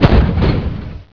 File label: Howitzer; Nuclear Hammer